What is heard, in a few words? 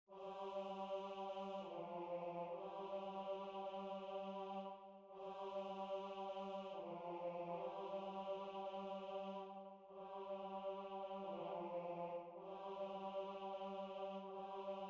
tenor